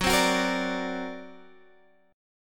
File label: D Augmented 7th F sharp, guitar